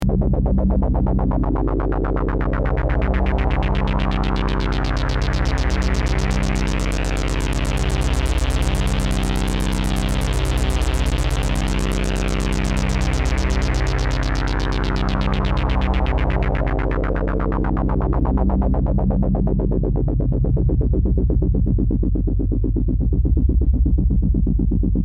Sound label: half res adsr lfo LP; state var vcf; analog synth